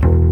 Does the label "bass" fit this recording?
Yes